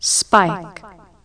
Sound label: spike